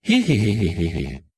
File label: hehe; baymax